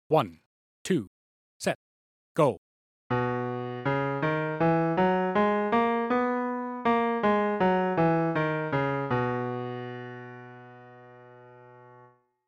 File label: Gb 1 octave 80bpm